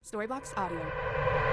horror reverse ramp vox

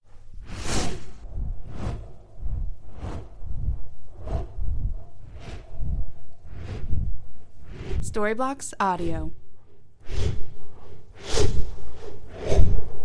large dragon wings flapping

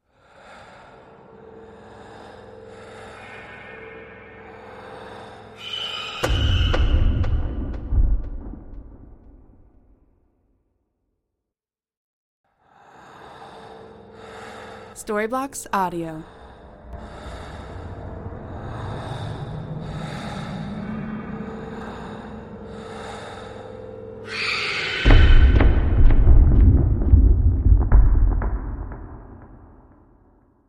killer scene with breath and scream

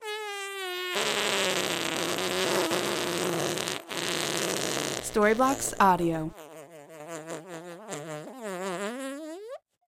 fart hard long funny

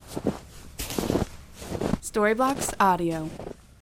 walking snow

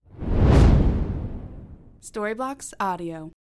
fireball swoosh

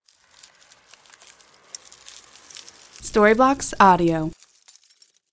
ice freeze super power long

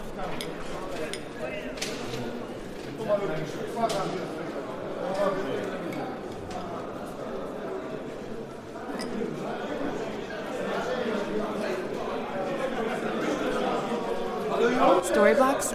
tavern ambience looping

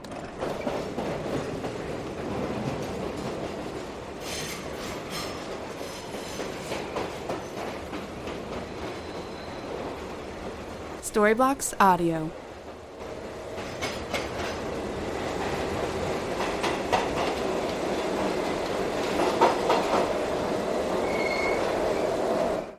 train tracks

